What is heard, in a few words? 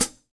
Jazz Pedal HiHat